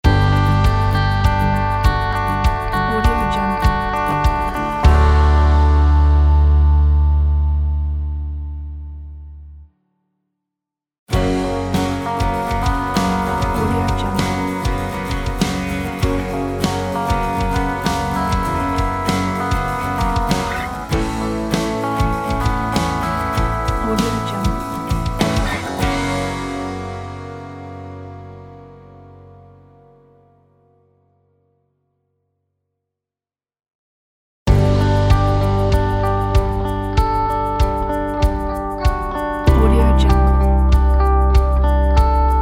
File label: romantic folk logo pack